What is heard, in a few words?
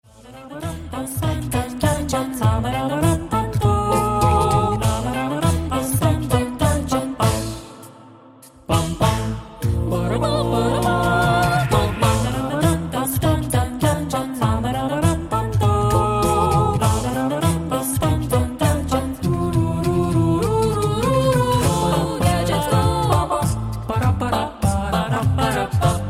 Cartoons music